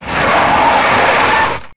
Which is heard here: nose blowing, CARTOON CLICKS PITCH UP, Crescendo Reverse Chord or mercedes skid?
mercedes skid